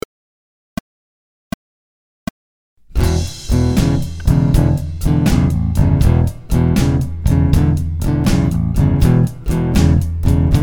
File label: 12 Bar in E with Easy Solo